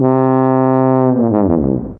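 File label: Trumpet Fall C3, Fairlight CMI IIe; Brass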